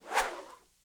Whoosh, Arrow, Feather, Slow; Universal SFX

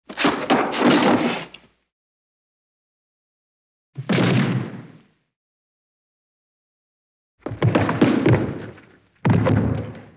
body fall; JERRY APPS WINTER

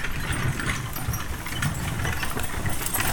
Horse Drawn Wagon; Electa Quinney

